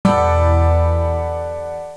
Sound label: power6100, apple